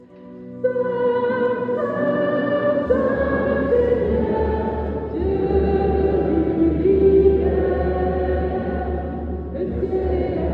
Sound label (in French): messe chant sanctus; CHEF BOUTONNE 79; EGLISE NOTRE DAME FETE PAROISSIALE